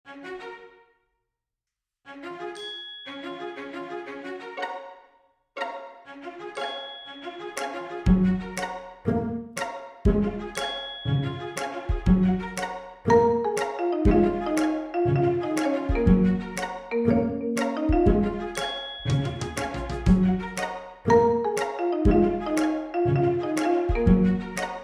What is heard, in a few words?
String Thing